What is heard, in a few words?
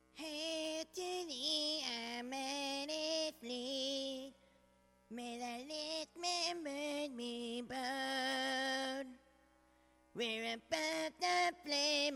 The STARFLEET Anthem, sung; ussjaguar